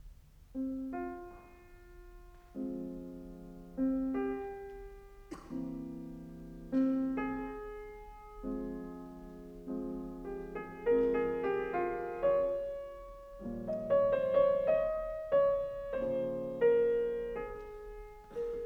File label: in F Major, Cello; 12 Variations on Ein Mädchen oder Weibchen from Mozart's Die Zauberflöte